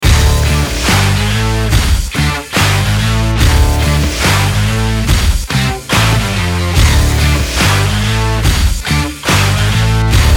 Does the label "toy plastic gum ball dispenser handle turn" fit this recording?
No